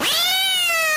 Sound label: meow ahh